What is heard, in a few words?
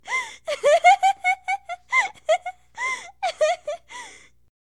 Tickle; Kitchen; Hilzartov; Fairy Size Doesn't Matter